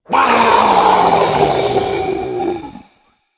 Snow Ogre Death

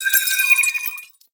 Mana Geode Play

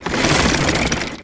Enter Play

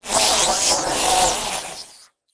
Deathweb Spider Death

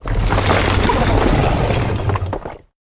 Iridescent Gyreworm, Play

